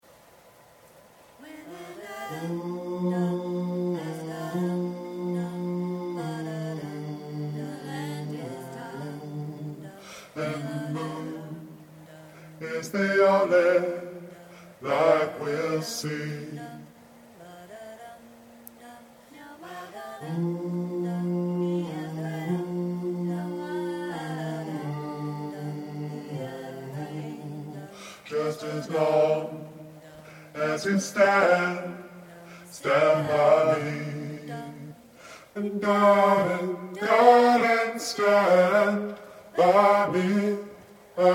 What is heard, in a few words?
bass